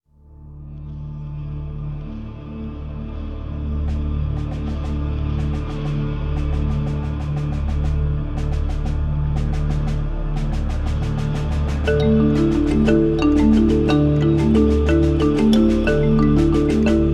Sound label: Double Drums